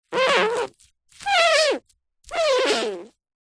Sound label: plastic cellophane sheet rub squeak rustle; foley